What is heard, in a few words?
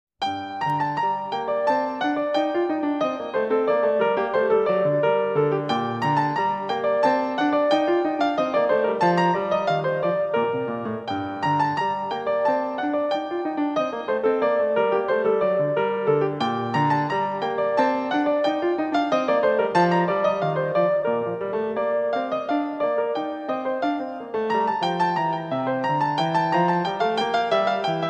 gavotte; angliyskaya sjuita; re minor; BWV 1069